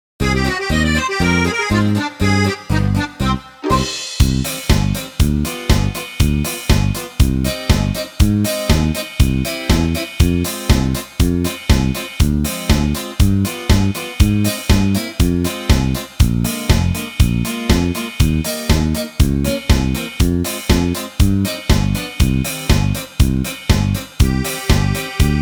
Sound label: karaoke